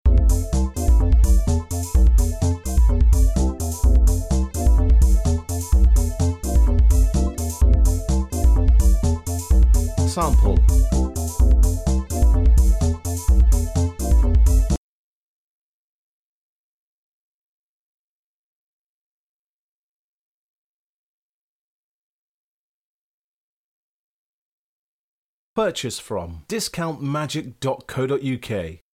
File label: electro, backing